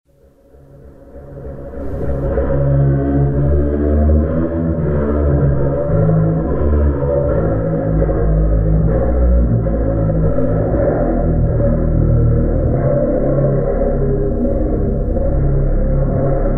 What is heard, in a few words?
Bass Played With Bow